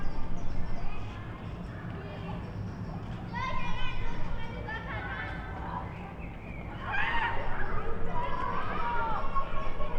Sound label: screams